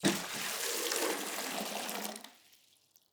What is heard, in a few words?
waterbucket fill container; Rust